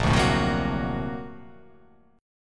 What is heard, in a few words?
Bb Augmented Major 9th Bb; Bb+M9 strummed; JGuitar